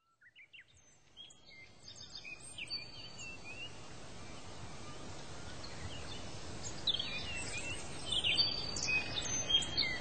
Ambient Birds; RollerCoaster Tycoon 3 Soaked and Wild

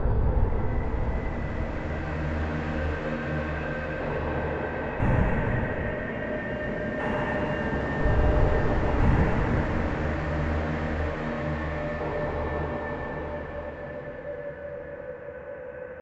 Danger Tonal; Insanely Twisted Shadow Planet

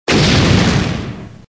explosion; Gun Mayhem 2

